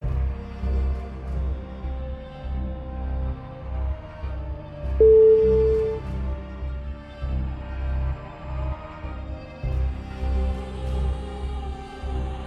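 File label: epic trailer, music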